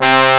trumpet double; Amiga Special - Software Hits; orchestra

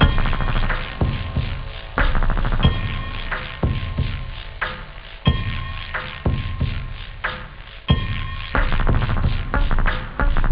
ping; OctaMED SoundStudio V1

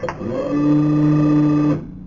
Open

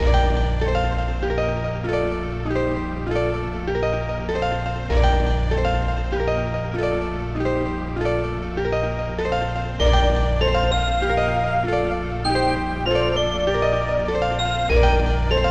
soft arp; OctaMED SoundStudio V1; mug-members

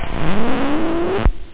rip, scratch; OctaMED SoundStudio V1

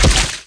body fall mud; Spearhead Demo; MacFormat 2003 June

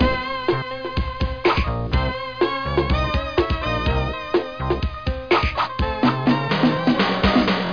Jam

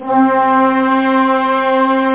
string; totalrecall

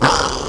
squeal; SimPiglet

